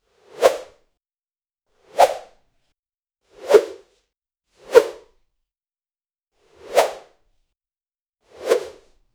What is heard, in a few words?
waving hand whoosh